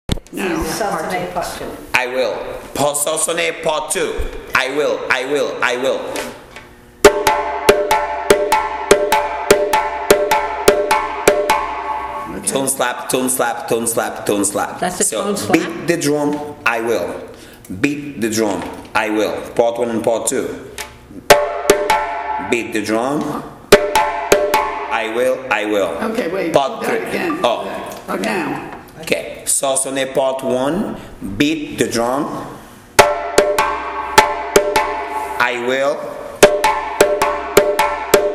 saucsone, tone slap, lamba kakilambe sunu kuku bolowee funga sausone, drumming lesson